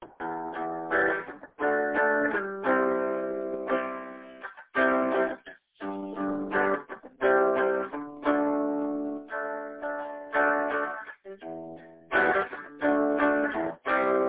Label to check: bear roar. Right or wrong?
Wrong